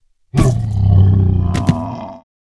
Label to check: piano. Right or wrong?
Wrong